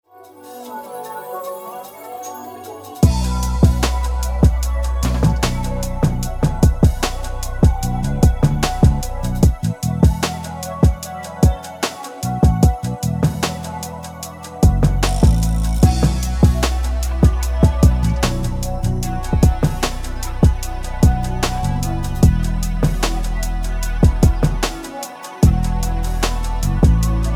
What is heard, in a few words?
rap drum kit; luxury